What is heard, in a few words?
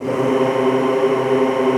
C2-L, Extended Classical Choir; Best Service